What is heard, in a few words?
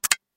digital toggle switch